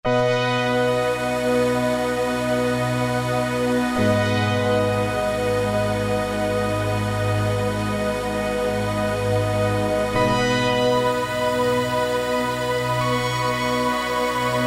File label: Yamaha TG33; SP-Quire